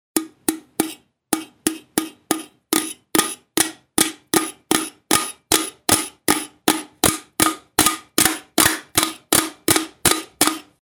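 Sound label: jars sound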